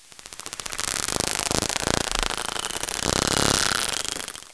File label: tursi clk